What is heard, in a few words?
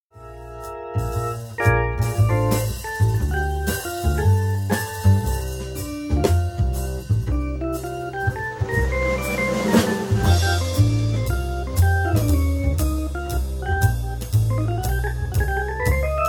Wine Trio